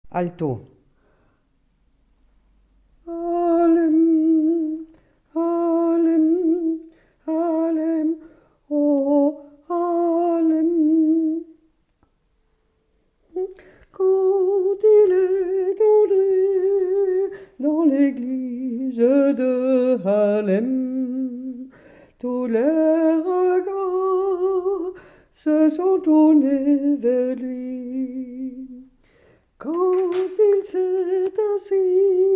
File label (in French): Voix Alti; Alto